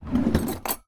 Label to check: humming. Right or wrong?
Wrong